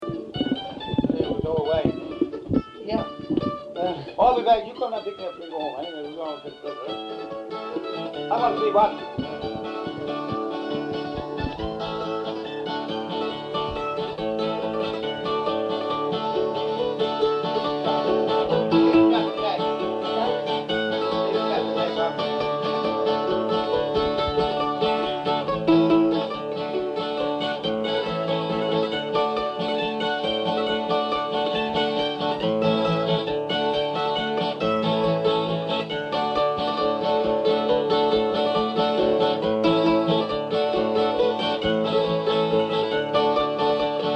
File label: unknown tune (mandolin)